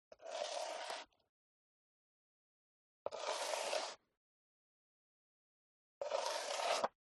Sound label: STEP High Heels Concrete Slide Long; FOLEY; smartsound